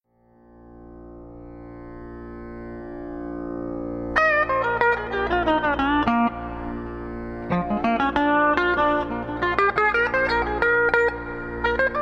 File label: Blues Run drone, pedal steel